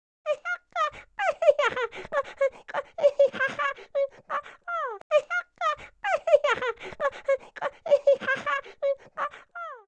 Baby walk

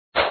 Snowman Walk; sumo snowman

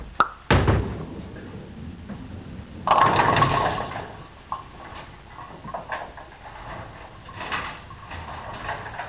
strike, bowling; WAV Sound Effects; sports

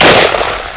ber shot; matrixhl; weapons

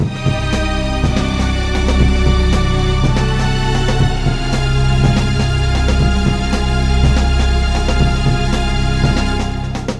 Classical

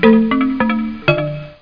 african, music; ClickArt 300,000

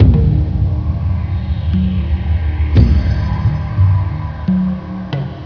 Menu drum; Ghost in the Shell

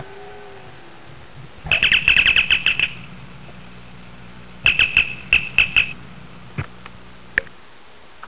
com beep

